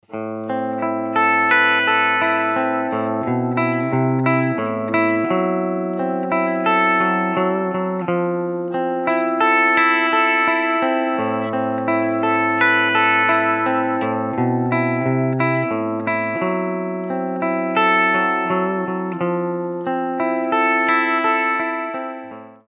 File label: CLEAN, Guitar Rig; GR5 Presets